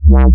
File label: Bass C, Offbeat Bass